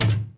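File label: droid bodyfall lg metal; droids robots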